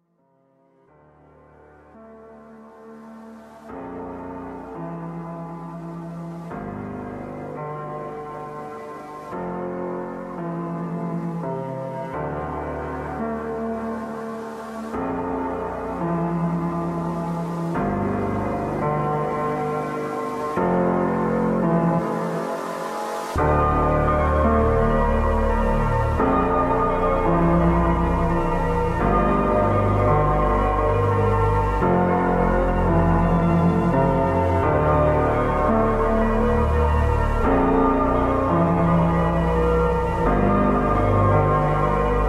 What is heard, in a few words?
slowed reverb